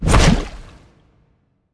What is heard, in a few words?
Cast Ice; smite; merlin